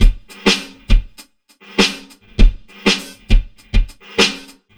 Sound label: Synco Beat, Drums; Beats